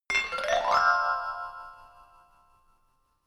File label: notification glockenspiel low slide up; instrumente